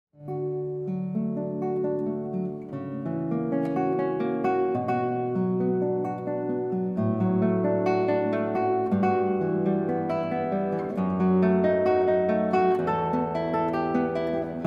guitar romance

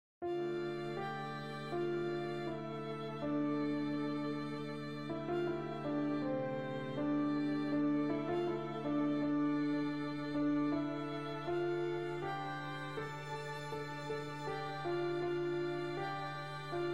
keyboard and strings; play along; hymne let my prayer

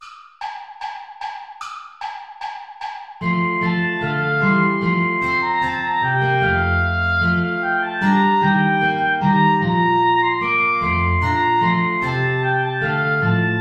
ballet play along without oboe; praetorius michael

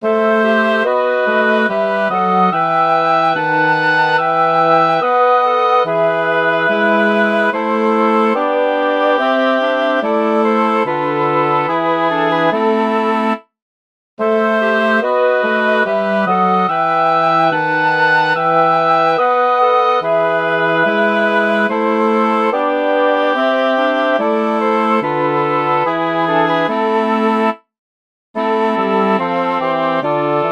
chorale for woodwind quartet; bach johann sebastian; hier ist das rechte osterlamm

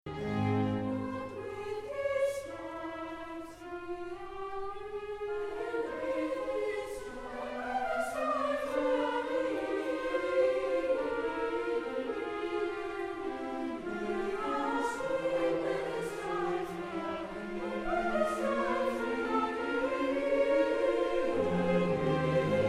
concert choir chorus; and with his stripes are healed

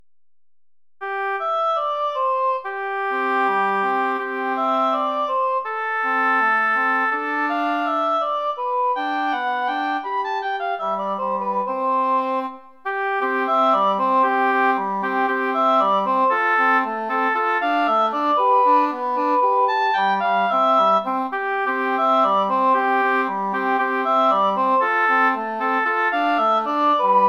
jingle bells; pierpont james; the one horse open sleigh

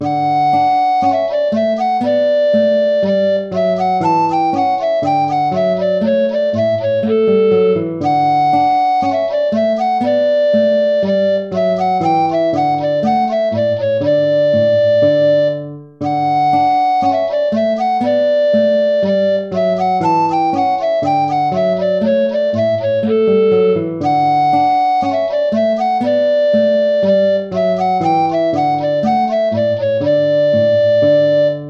allegro; hook james

